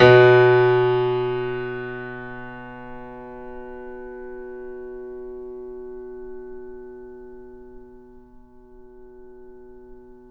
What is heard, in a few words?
Mf B 6, Grand Piano off mic; AKAI S6000 CD-ROM - Volume 2